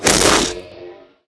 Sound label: shove, antlion guard near; hl2